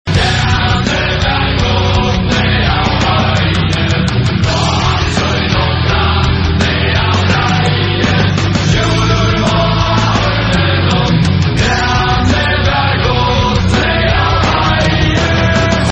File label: viking metal